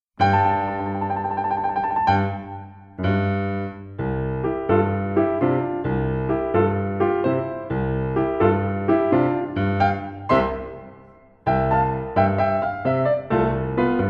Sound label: Beat; Rung nhiet doi La Si Do